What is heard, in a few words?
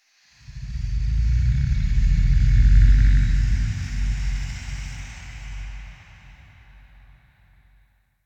Inhale Large, Ambience